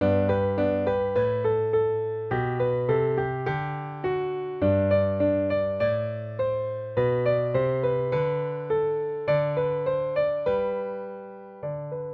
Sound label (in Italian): pezzo per pianoforte; Spunto di un pezzo per pianoforte; Versione G. Denini